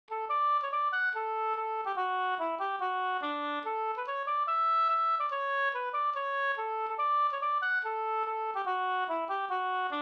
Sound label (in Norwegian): reinlender